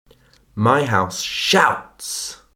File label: shouts